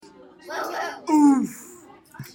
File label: oof